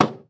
Glass

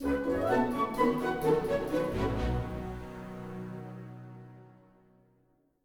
Ambiance 64bpm End; asterix obelix slap them all 2

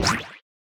Enemy hit; super mombo quest

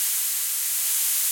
Ventil Aigu Gaz; Puddle Plus; iOS game rip

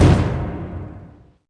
Impact; NASCAR Racers

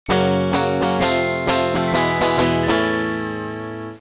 Defeat; Let's Play Journalists; NDS

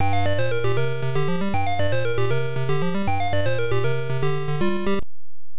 Start; Kung-Fu Master; Irem M62

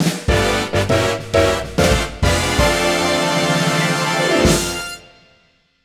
JINGLE - Large Gain; wii party the unofficial soundtrack

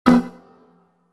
Melodic error; WeMod

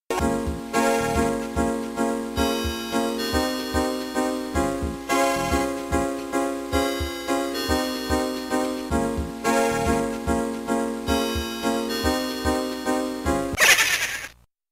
Pacman Arrangement - Continue; Pac-Man Collection; GBA

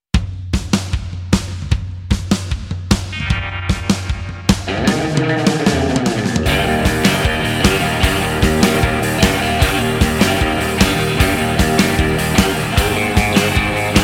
Surfing Blues

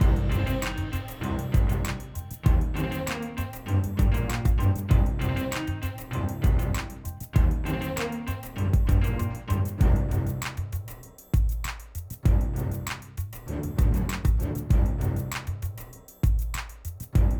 Groove; ninja turtles legends

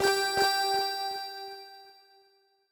Chime; chime sharp

